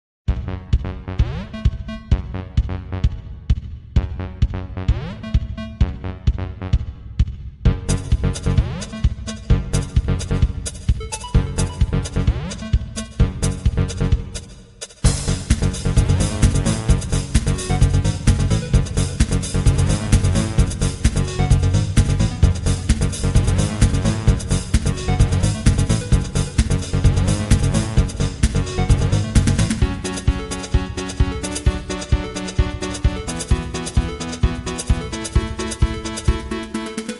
Level Track; block breaker 3

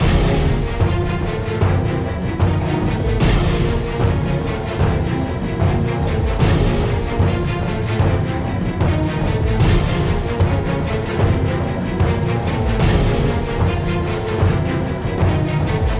Battle Loop; Infinity Blade 3

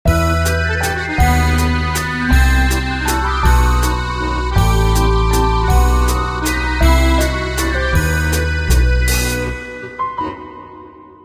Level End Dialog; Pastry Passion

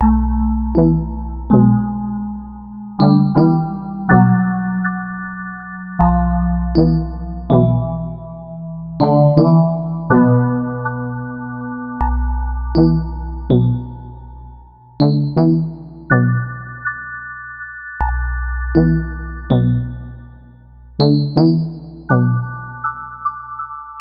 stem intro; Pix the Cat

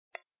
Horse

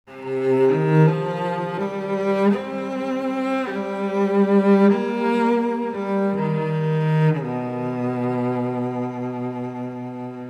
Variation (Cello Base); max payne 2 original soundtrack game rip

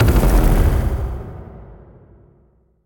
Pig stomp; super mombo quest

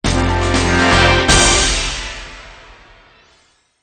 Jingle Battle Start; Bullet Girls Phantasia; PS Vita

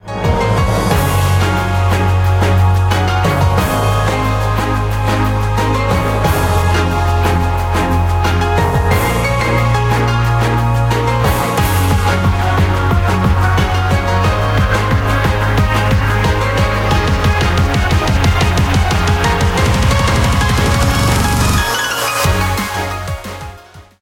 BGM xfade; Invaxion

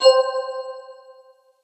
Start Count Down; All Star Fruit Racing

